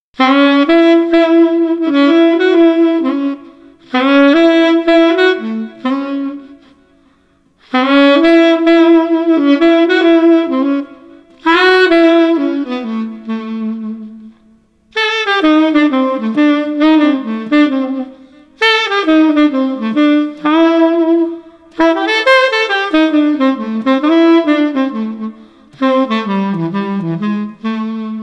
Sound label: Street Sax Mellow